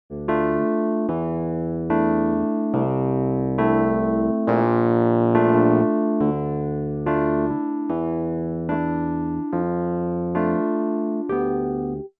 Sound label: numaconcert rhodes